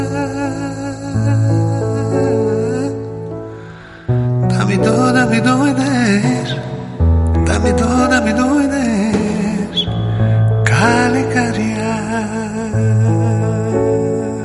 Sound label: Piano